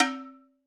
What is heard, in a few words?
Un Bottled, Alesis D4